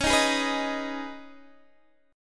C sharp 7th Suspended 2nd, C sharp, down, Guitar, Open D